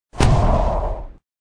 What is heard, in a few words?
bullet create; croods